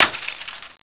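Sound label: glass break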